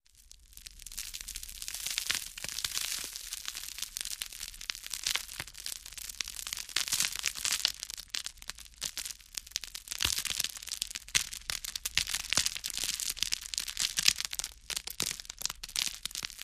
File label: Grit Gravel Trickle, dirt